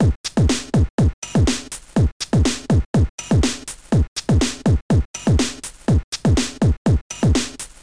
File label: hiphop2-(098\05-bpm); octabeats; OctaMED Module; MED/OctaMED (4ch); OctaMED v5 MMD2; Techno